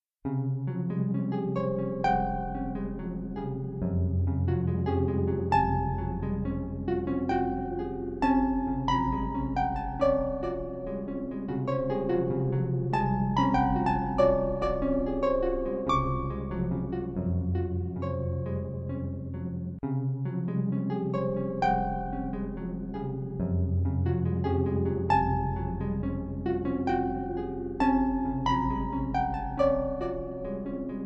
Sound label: Arpa